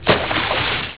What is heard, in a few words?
splash; submarine game